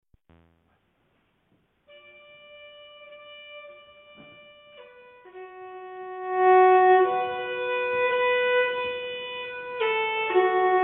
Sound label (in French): Valse, nyckelharpa